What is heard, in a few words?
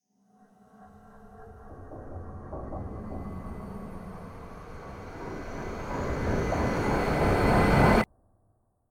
Horror Build Up; Repressed Memories